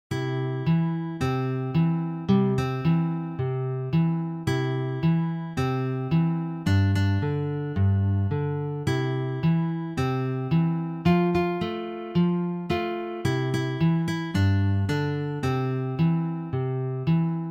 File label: goodnight ladies, Gitarre; traditional